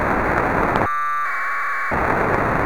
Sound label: packet from rtl; sdr